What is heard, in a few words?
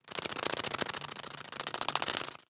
insect fly